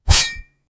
final sword slash; combat

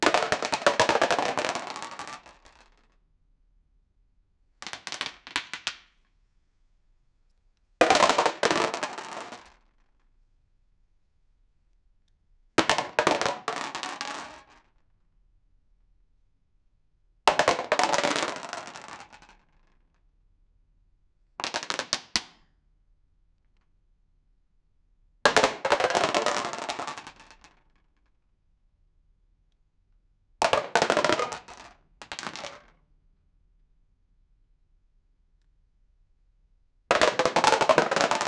boxes falling from

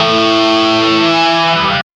distorted electric guitar, level up

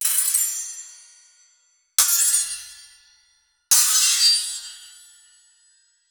sharp metallic sword clas; high end

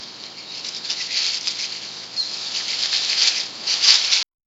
light rustling of leaves

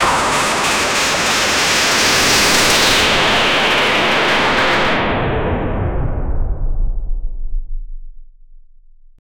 dash sound very speedy

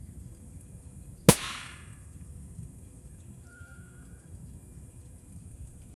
sudden whoosh of flames, fire

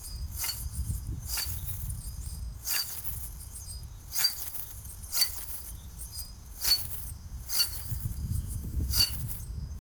outdoor, sound-effects

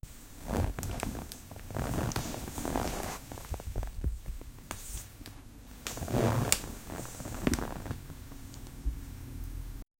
wearing a coat, clothing

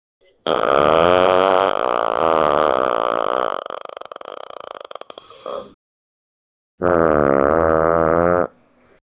person humming then soon; humor

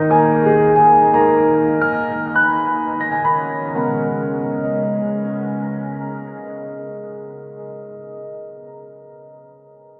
piano slow tempo soft

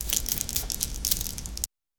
sound of elements appeari, appearing

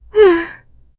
female character smirking; confident

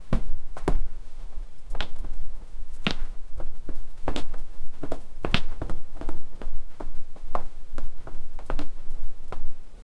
tiny footsteps of, basket; miu

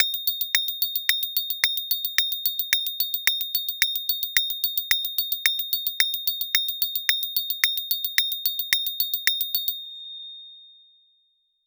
sleigh bell at 110bpm; festive

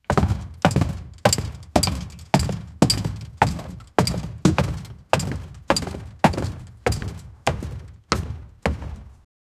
several heavy thumping bo, boots

